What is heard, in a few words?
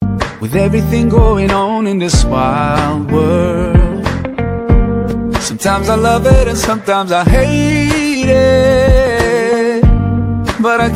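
english islamic ringtone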